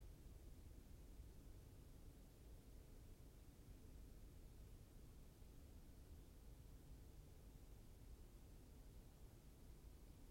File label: yamanakako sound